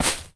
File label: hay step; Golden Crayon Sounds